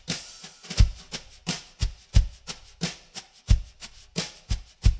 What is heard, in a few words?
drum